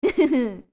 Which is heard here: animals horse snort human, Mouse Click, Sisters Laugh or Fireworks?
Sisters Laugh